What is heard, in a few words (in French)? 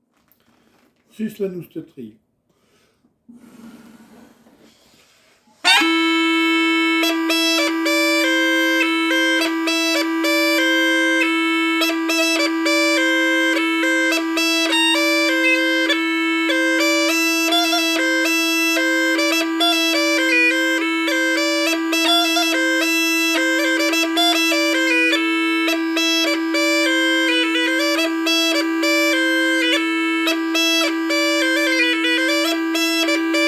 Rondeau Sus La Nosta Trilha